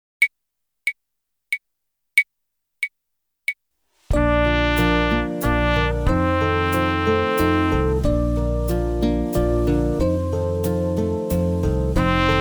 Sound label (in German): Echo Ubung